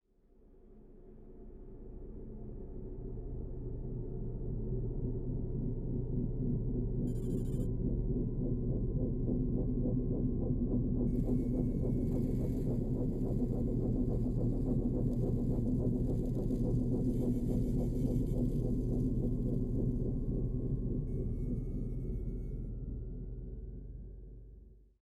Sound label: fan; Minecraft; liminal-industries